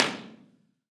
Fort Worden Pillbox, Impulse Response, Underground; EchoThief Impulse Response Library